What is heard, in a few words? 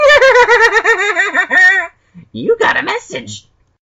Creepy laugh